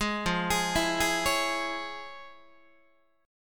F Minor Major 7th Sharp 5th, Guitar; FmM7#5 arpeggiated; 11,13,11,14,12,12; 4A,4E,5A,5D,5G#,6C#

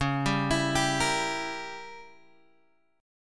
Db Diminished 7th Db down Guitar Open F; arpeggiated

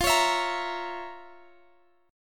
F Major 7th Suspended 4th Sharp 5th, chord

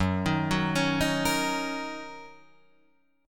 Gb 6th, Guitar Drop D

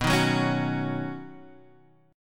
B Major Add 9th B, Guitar, Half Step Down

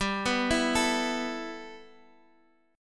G 6th Add 9th, G, Guitar, Drop D; x,x,5,4,5,5; down

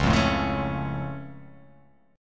C sharp Augmented down, guitar chord

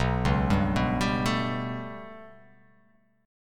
B 7th Suspended 2nd Sharp 5th down, chord; B7sus2#5 arpeggiated; JGuitar

